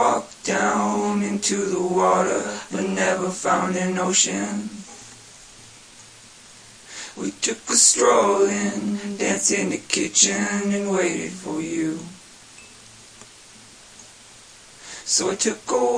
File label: walk down to the water vocals, Folksy Guitar and Voice Stems